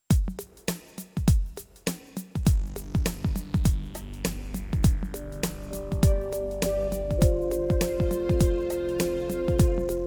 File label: Instrumental